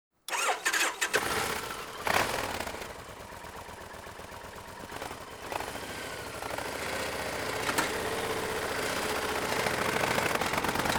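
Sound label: Kawasaki VN 1500 Clasic Tourer (On the road), motorcycle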